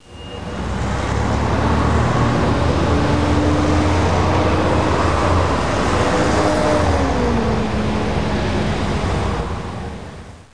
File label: amb